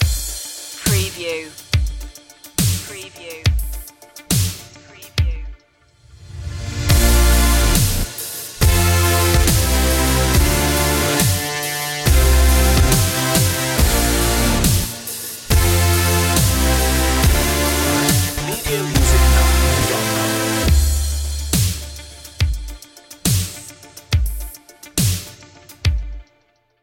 trip step dub step

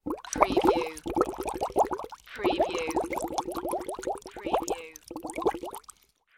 Bubbles Straw Blow; sound-effects-bible

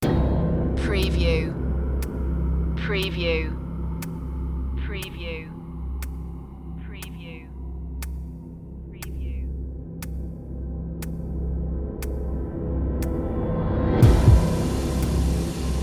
tick tock tension

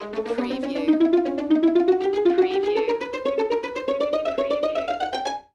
Music Sneaky Pizzicato

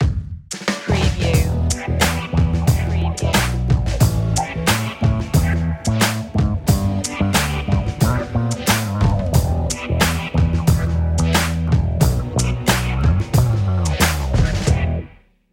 crazy funky bass

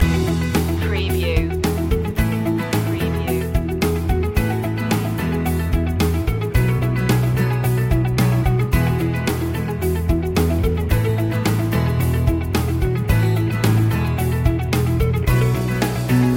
motivational living loop

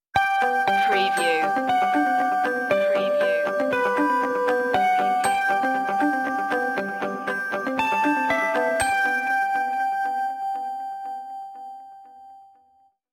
flying high stinger

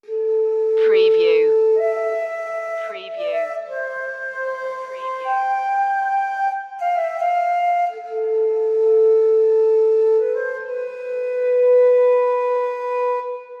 solo flute

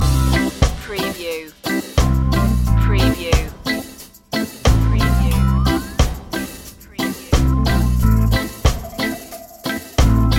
rock steady loop

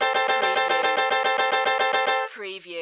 Casino Electronic Sound Wheels Spinning

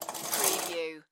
Shower Curtain Open; sound effects bible

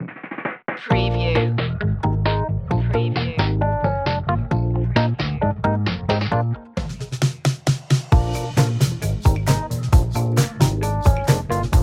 playful comedy

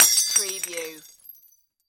Glass Break Wine

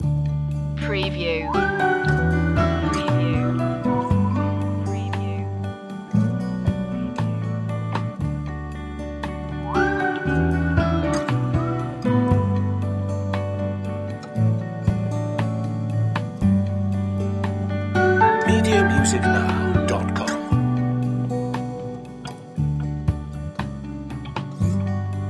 simple thanks loop

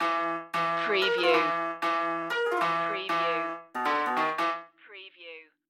wildcard sally stinger

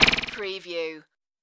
arrow flutter impact

